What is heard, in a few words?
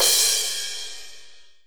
soft hit finish